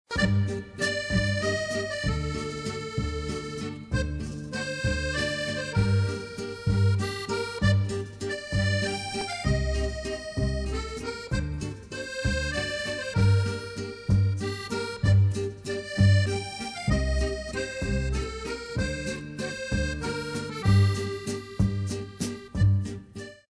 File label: French Bistro